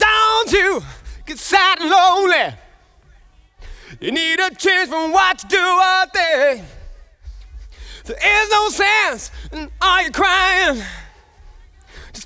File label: Verse Vocal; Multitrack; Remix Parts